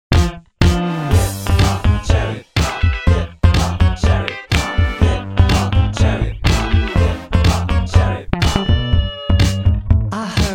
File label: Hip Hop; View-Monster